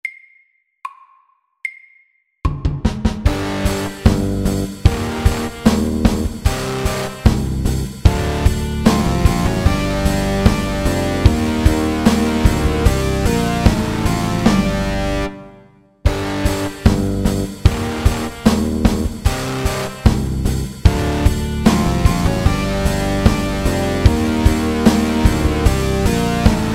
Minirock SLOW 75

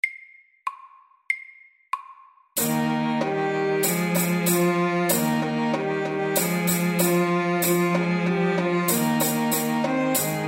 Folkedans SLOW 95

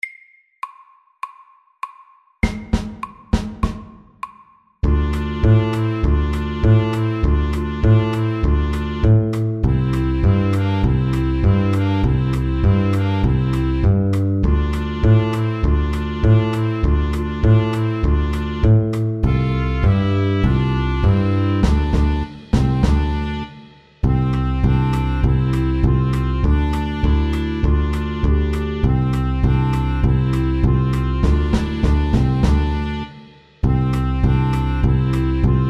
Balkan stomp SLOW 100

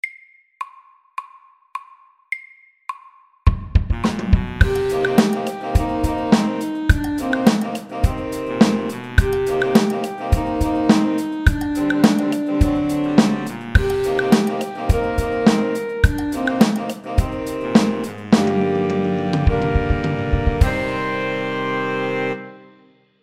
Funky drums

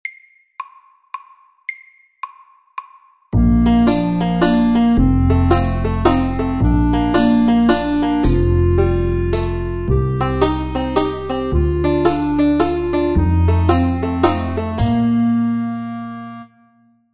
MEDIUM 110; Funny bunny

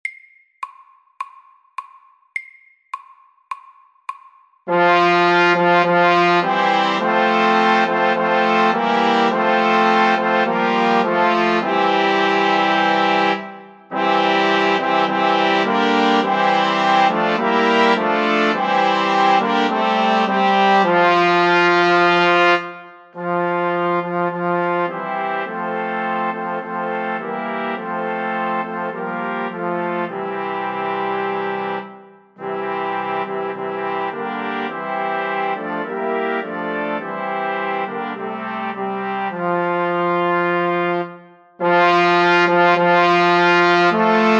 Fanfare for tre MEDIUM 104

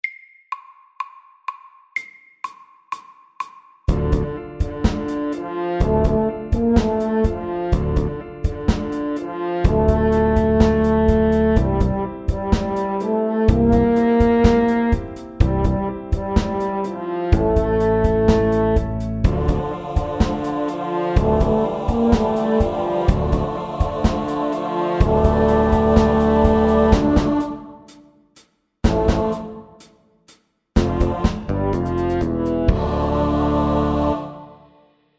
A minor rap

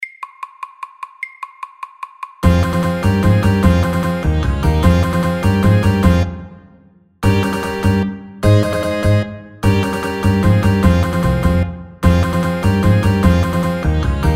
Spanish pepper FORT

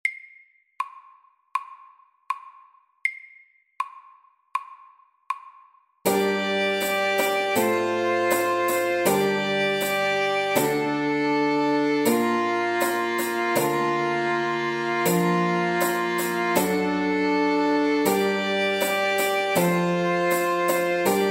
Old English dance SLOW 80

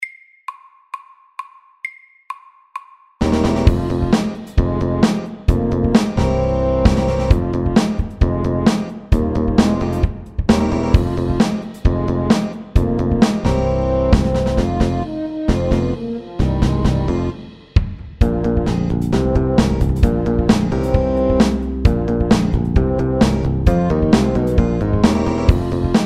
Funky

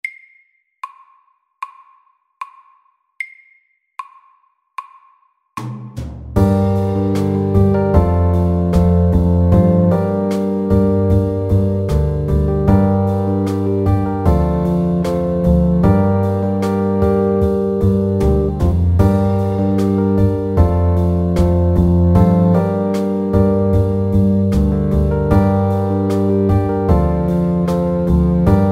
Pop ballad FAST

